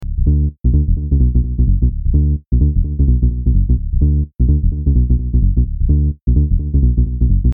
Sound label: Bm Bass, 128